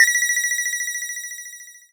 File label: magic sms